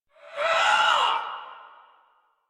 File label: Attack Effort; Warframe; Dax; Ranged